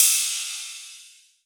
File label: Rides, Cymbals